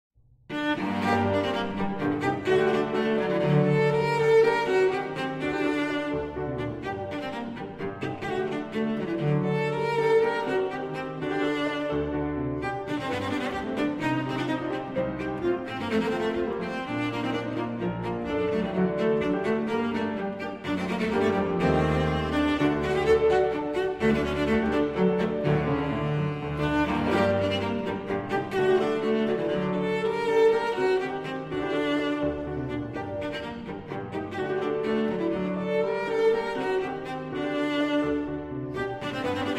Sonata in G minor Courante Allegro con spirito, tuba; Eccles